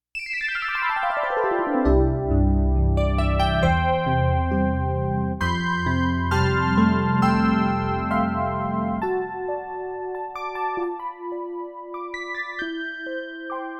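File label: fm electric piano; RD-800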